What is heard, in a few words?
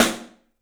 Studio Snare